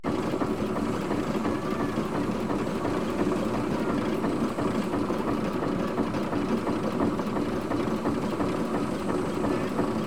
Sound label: Traction Engines Driving Farm, Age Of Steam; BBC Sound Effects Library